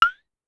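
Claves Up Slow, Drum Machines Clavia DDrum-AT Pitch Envelope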